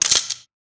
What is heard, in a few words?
gun loading; soundscrate